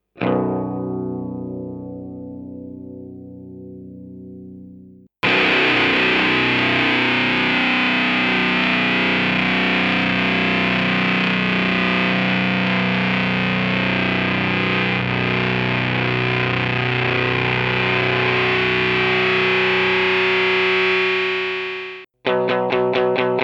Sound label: big muff